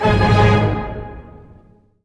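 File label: G sharp trill 16th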